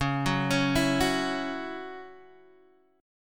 C sharp Minor Double Flat 5th C sharp Guitar Open D